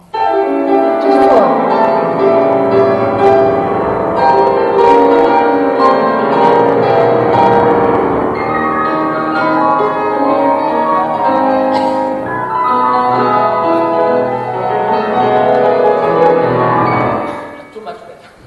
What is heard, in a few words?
chopin; Too much pedal.; 这是错的